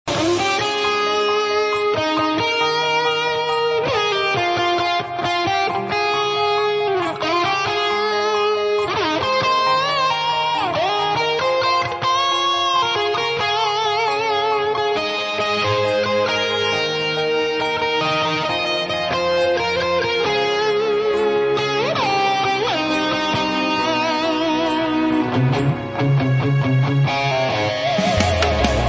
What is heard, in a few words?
GUITAR RINGTONE